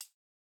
Jrock hihat

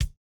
no effect j city pop 1980 kick

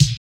no effect j city pop 1980 snare

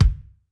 Kick; Reference beat bpm95; urban grooves; Rock

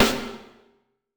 hiphop snare, Effect on

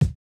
kick, house

